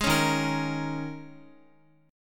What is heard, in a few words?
Eb 7th Suspended 4th Eb, Guitar